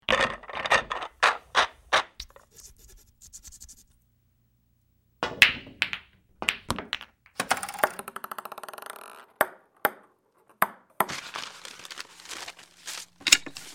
Rec Room Games

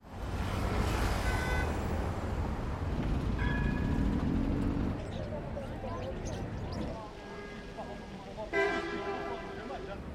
Urban Europe; Boom Library

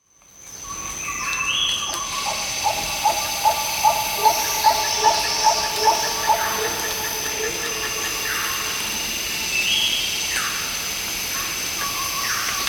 Tropical Rainforest; The Producers Library

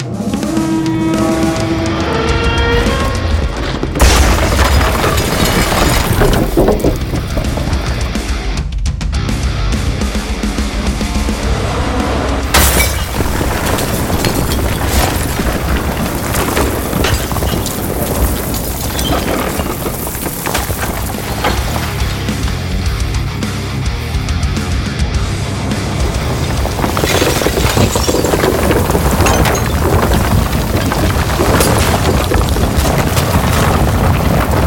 Demolition; SFXtools

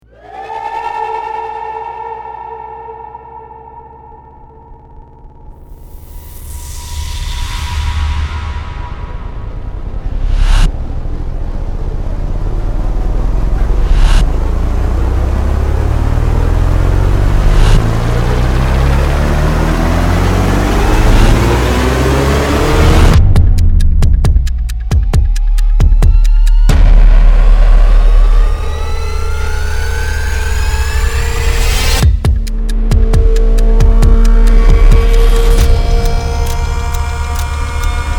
Rise and Fall; Field and Foley; Rise and Fall Essentials

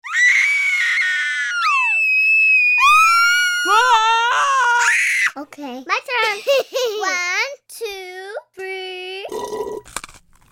Human Voice; Big Room Sound

